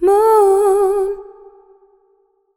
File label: Moon, Female Vocals, Wet; Advent Calendar 2017 Day 1